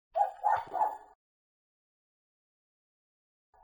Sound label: bark, owl